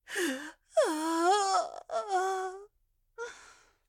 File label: Agony Pain Standing Still; Zara